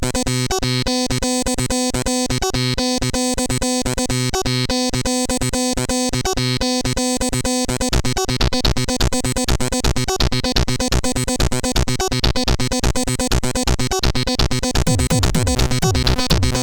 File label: Compo Music, sid CSG8580R5; Dooshek